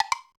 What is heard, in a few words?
selector click